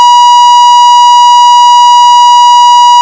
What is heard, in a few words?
tone